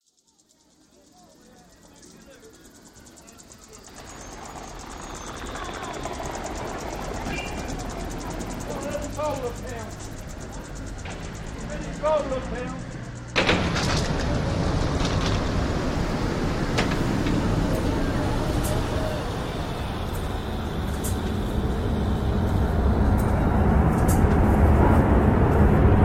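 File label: soundscape London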